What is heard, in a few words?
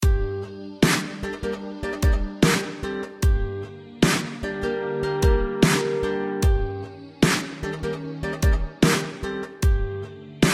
Dub Step; madout2 bigcityonline; Dark Kastle